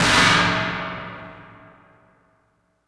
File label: Dub Snare; Sonic Foundry (Sony Creative Software); Electronic Point Blank; Drums & Percussion